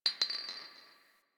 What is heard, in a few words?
Garbage Glass Drop